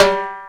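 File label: timbale hit; Sounds Good - Roots Reggae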